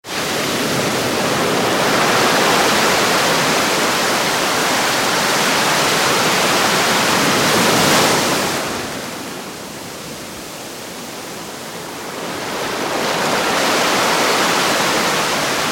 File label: Ocean Sea Medium Waves onto Sandy Beach; Ocean Sound Effects; Nature